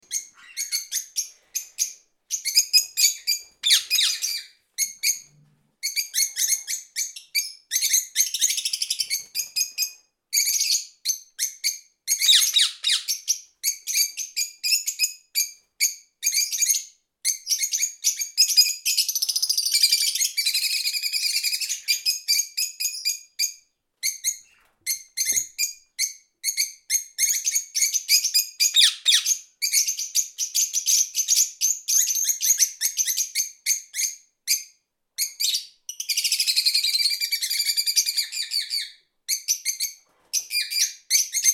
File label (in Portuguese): aves exoticas